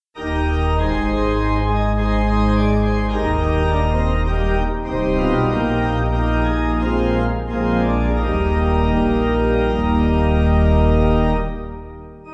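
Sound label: pipe organ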